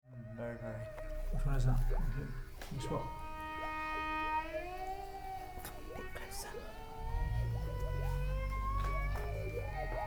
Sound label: string quartet